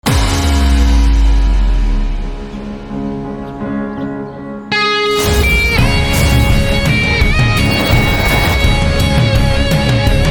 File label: Guitar Version Instrumental